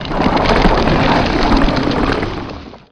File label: Plant Giant Stalk Grow; SFX Kit; Foley - Misc